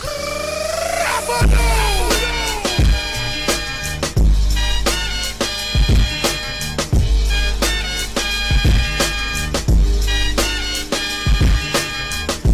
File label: trumpet